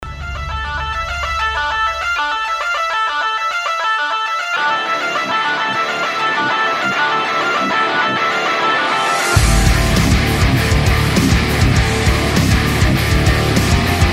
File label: theme cover bagpipes